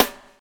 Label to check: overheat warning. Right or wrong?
Wrong